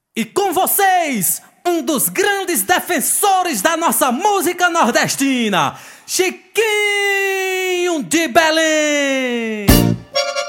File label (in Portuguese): abertura instrumental